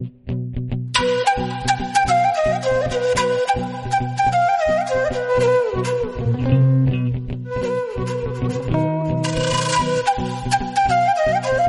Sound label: instrumental